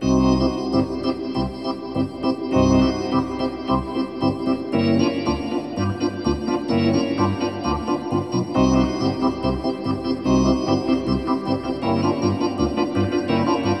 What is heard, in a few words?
Hockey Organ